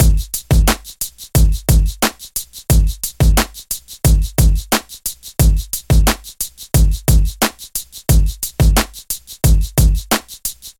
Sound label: Hip Hop N Shake